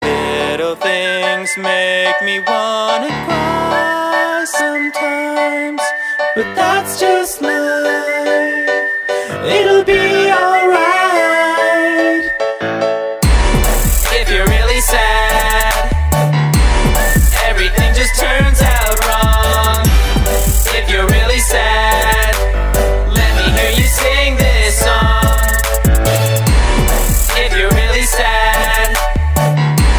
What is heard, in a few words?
Sad Song